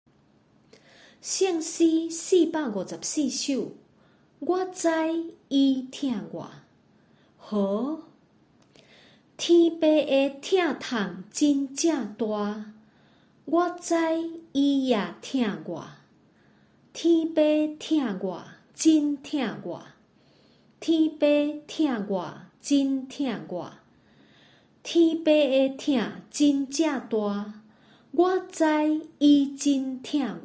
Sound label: Chorus, children; i know he loves me